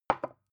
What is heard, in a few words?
glass put down tipping; objekte